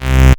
Bass C, Offbeat Bass

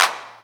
Claps; Vengeance Samples

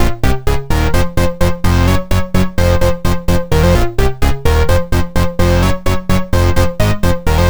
Melody 128 BPM Root F; Vengeance Samples; Melody Kits

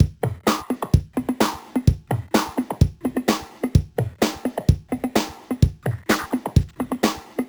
128BPM Kit; Vengeance Samples; VFH1 128BPM Basique Ale Kit

